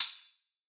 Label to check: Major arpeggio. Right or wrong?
Wrong